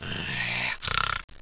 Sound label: growl; animal